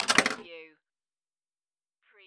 Pinball sound, rattle and clank

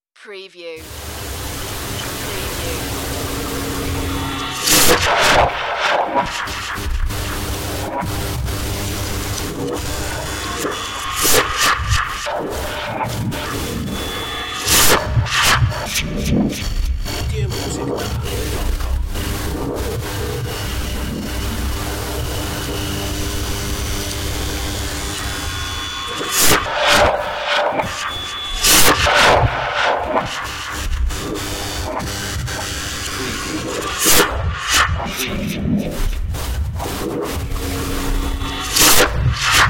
painful flashback ambience

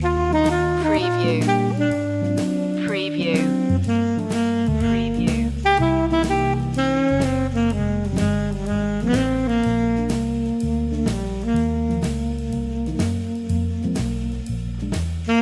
loop

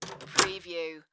Door Hotel Lock Int

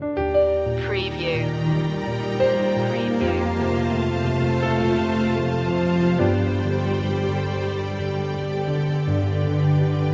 until we meet again loop

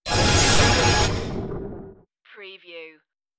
robot servo malfunction

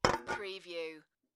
Empty Can; beanstalk audio

